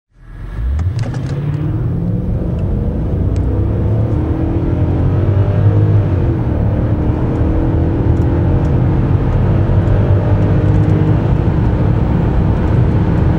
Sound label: Audi A8 4,2 0-180; biler